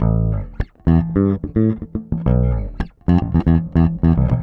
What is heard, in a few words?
108 Old School Bass A, E-Bass; Pop Rock